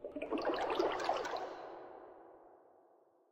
water step; Minetest; backrooms

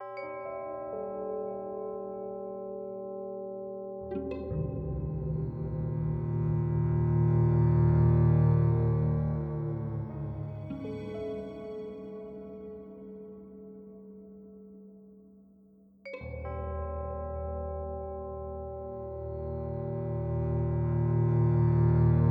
Zombie Ambient; pzserver

